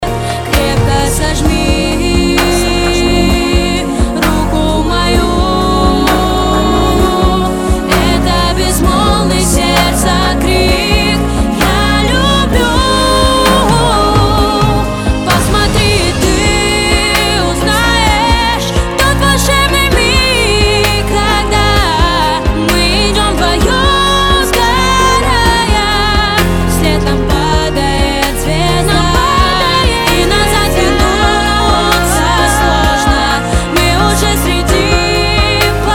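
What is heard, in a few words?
backing vocals with music; Bek-vokal s muzikoy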